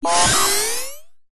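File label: science fiction cyberpunk robot surge power short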